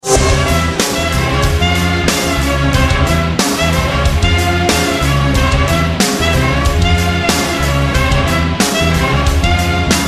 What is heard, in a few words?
Metal Arabic